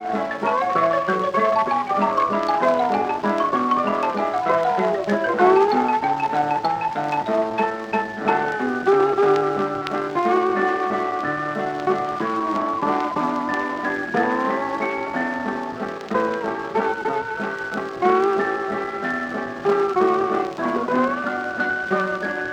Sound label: Hawaiian waltz; loc-edison